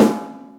beever, snares real

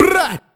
brrrrrr; Voice; ooh ahh

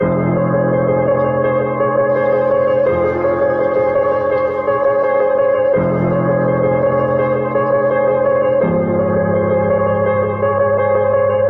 piano undercurrents of worry, Sad

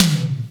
Toms

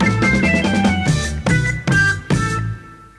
keys, phonky loops

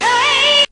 "hey" SUNG, voice; ooh ahh

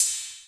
Cymbals, Electro

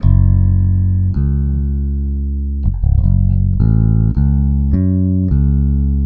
bass, Loops

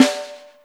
Snares, Real

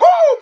woo

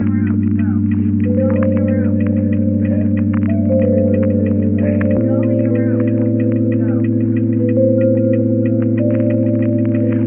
keys; Loops

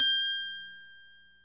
Bells

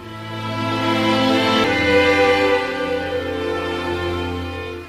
strings, Sad Loops

